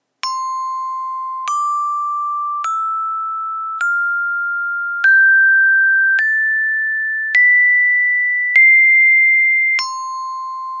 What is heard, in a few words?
Rubber Club